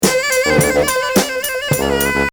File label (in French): vibrato saxo